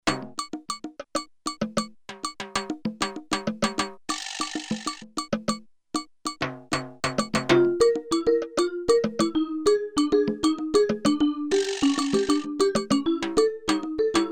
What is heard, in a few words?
Latin Percussion Vibraphone